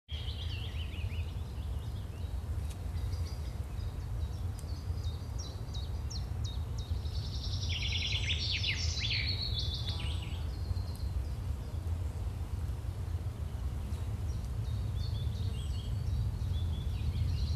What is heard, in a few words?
Birds Chirping on Field with farm nearby